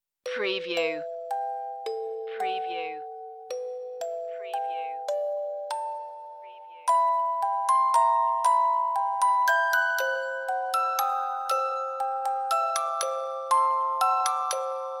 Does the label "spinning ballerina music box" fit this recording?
Yes